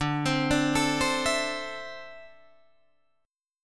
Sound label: D 7th Suspended 2nd, Guitar, Drop D